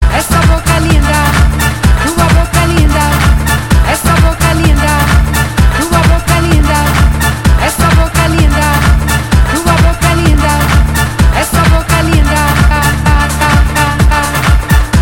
Ritmo Dynamic

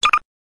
Beep Sound; Half Life

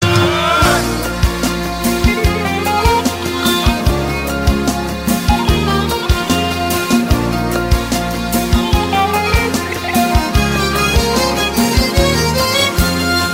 assyrian

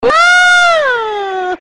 Ahh; Yogscast

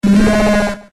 Cry; Miltank

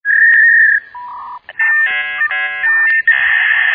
dial up modem

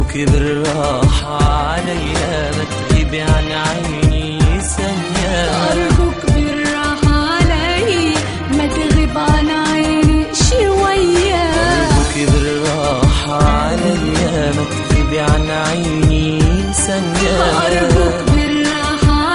Arab Sad Song

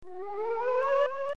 Ghost Appear; DKC2

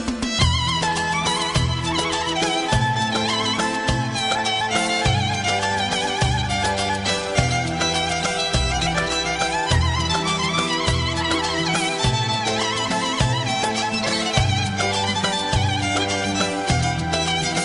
kurdish violin

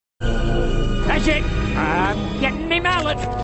Mallet

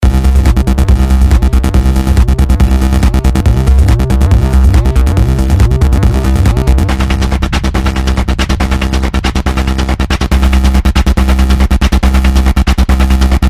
Base Beat

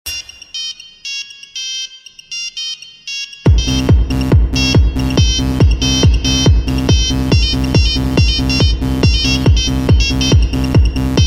Nokia hip hop

